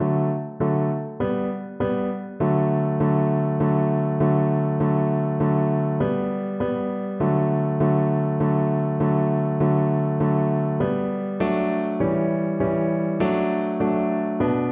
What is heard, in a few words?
Cello